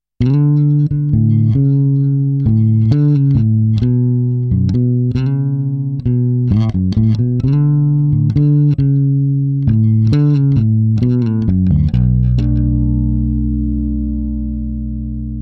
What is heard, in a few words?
MAXON PT9PRO+ Bypass